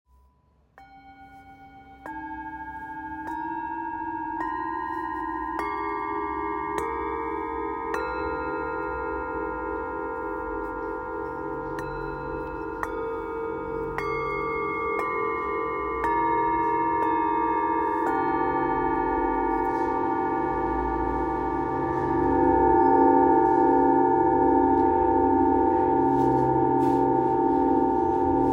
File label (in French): ocean bengale